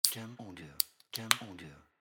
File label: Open Coca Cola